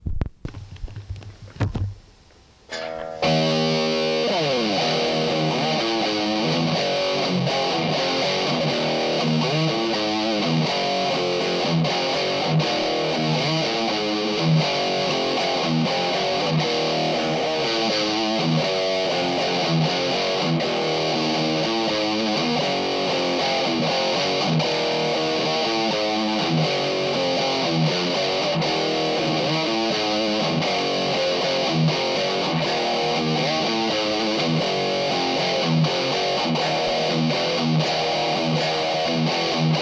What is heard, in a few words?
Riff Tapes; From Kirk's Riff Tapes